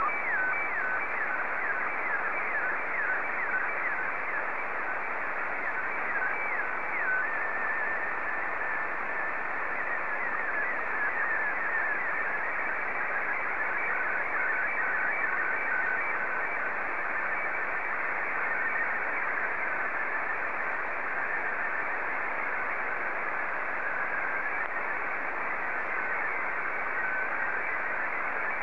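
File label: Martin 1, slow-scan television; JE5HTN; SSTV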